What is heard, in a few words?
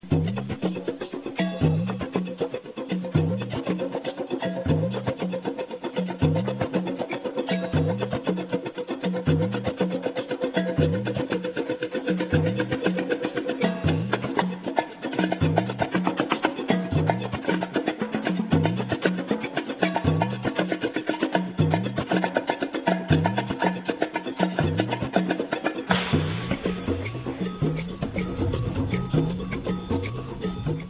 bongo